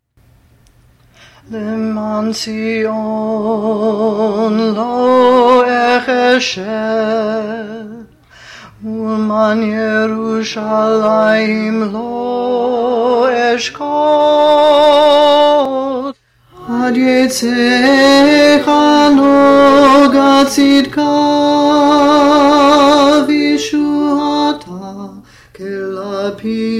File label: tenor; lmaan tzion havkin kr version